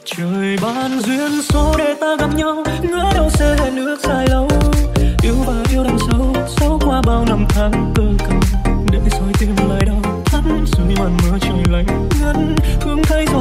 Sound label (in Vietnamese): nhạc chuông remix